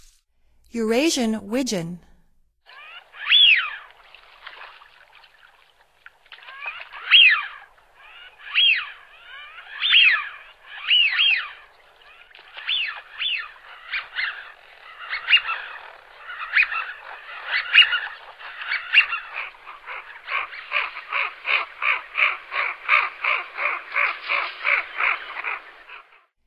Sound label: Eurasian Wigeon, Songs of Birds of North America; Stokes